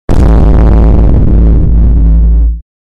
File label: Extreme bass boosted